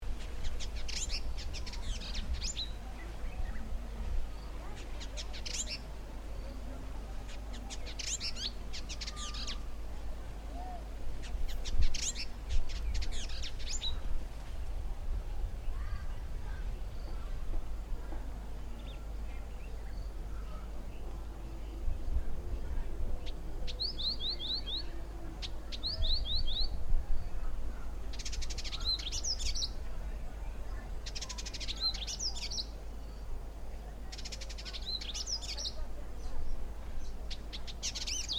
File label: Vidua chalybeata; Indigobird Song